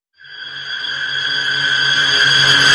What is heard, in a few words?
Suckback